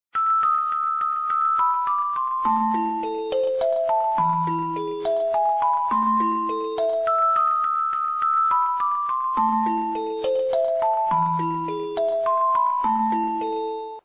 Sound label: pur elise